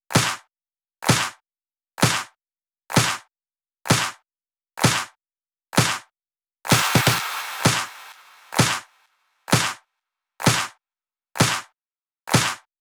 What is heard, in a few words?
Vocoder Clap Mix, 128 BPM; Vengeance Samples; VTDS2 Song Kits; Vocoder Mother Earth